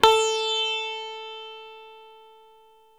SITAR A 3, CORAL SITAR; East West; Steve Stevens Guitar Samples Collection

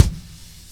Dance Drums; Best Service ProSamples vol.15; AKAI

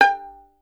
STR VLN, Solo Instruments; Miroslav Vitous

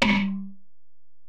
Tom, Alesis DM-PRO, Drum Machines, Toms

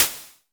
SN Basic, Vermona Mono15 Drums Snare

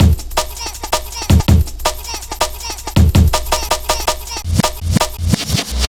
Jam 162, Drum Loops, Jungle; Jungle Warfare 1&2

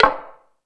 House Machine, Drum Machines

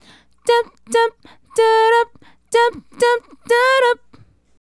Female Solo, Hip-Hop and RnB Vocals

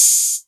808 Hat O; Novation Drumstation

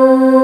DIGI WAVE, DIGI PAD

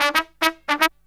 TRUMP, Killer Brass Riffs

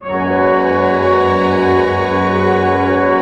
PENTA F# PP, orch penta; Sound On Sound - Peter Siedleczek's Orchestra

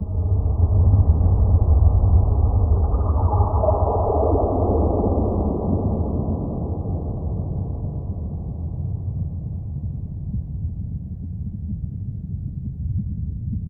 Scary, nightmares; Dream Zone

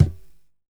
Cajon Fingertip; Best Service; Festa Latina VOL-1; Single-Hits

